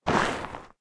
slide; subwayrunner

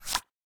move good; YummiCookie

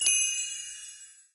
ui buy upgrade; frozen rush

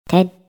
click; monstergo; pokebot

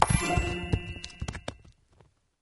pickup troll; frozen rush

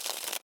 card take; Hearts

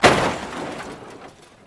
car crash barrier; lightning speed

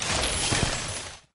ice magic platform; frozen rush; elsa

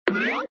touch block; Match 3 Animal

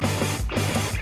intro sting; lightning speed

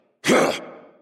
jump; star-wars-rebels-team-tactis

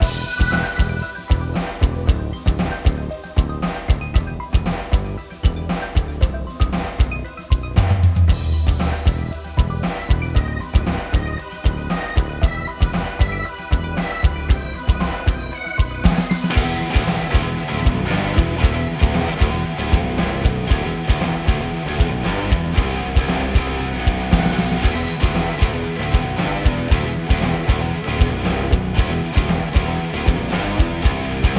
music game; spider-man-mysterio-rush-game; times square

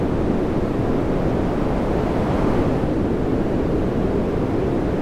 woosh; incredible hulk chitauri takedown

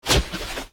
worm bomb zap; sweetcandymania

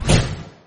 special shot; inlogic-basketballsmash

